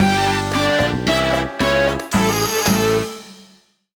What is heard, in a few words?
Mini-Game Draw; frogger ancient shadow